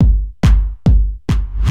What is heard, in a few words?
DRUMLOOP TEKNO 140; Techno2